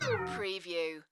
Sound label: Pinball sound, tone